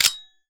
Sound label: sterling boltforward